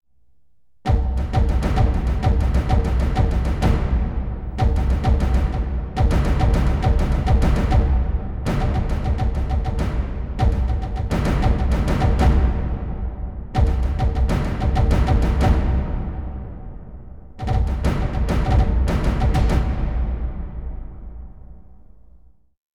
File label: Percus; Troy